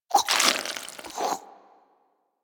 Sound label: Armadillo Tongue Move, Foley; Warframe; Hunting Critters